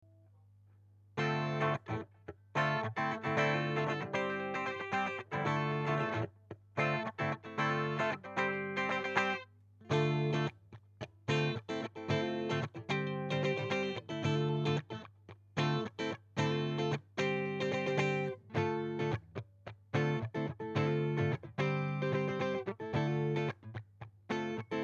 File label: funk